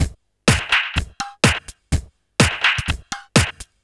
Da Click, Drum Loops, 125 BPM; Sonic Foundry (Sony Creative Software); Underground UK House & Electro

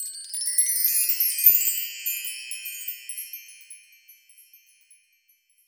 Chimes; Roland BK-5; Percussion

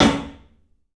CLUB SNARE; 3D Sound; 3D Drums

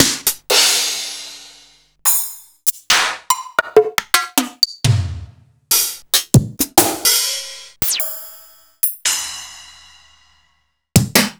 Akai MPC3000

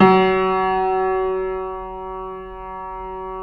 F#2, Keyboards Acoustic; USB Soundscan vol.17; AKAI

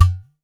UDU HIT, UDU DRUMS; AKAI S6000; WorldSounds

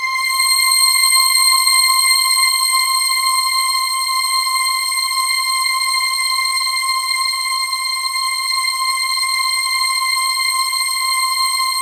STRINGED; MORPHEUS

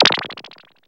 guiro, synth guiros; Zero-G Ambient 1

